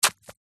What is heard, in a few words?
horror kitchen knife stab body guts squelches x1; zapsplat